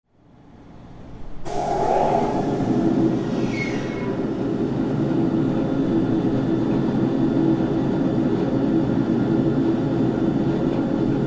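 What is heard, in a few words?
Grape Pressing Machine, factory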